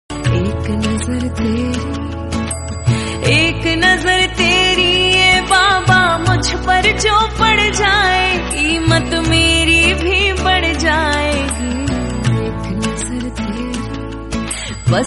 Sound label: Khatu Shyam Bhajan Krishna Bhajan